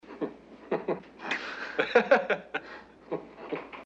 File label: laughter